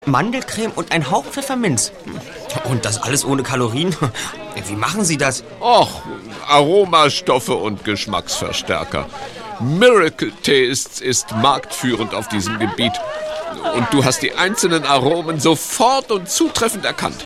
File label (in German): partylaune